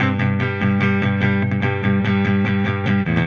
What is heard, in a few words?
Silos Clean Strum Guitar, Indie Rock